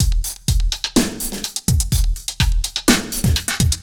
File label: motion loop 125bpm; dr.dezim